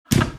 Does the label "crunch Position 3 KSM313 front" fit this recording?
No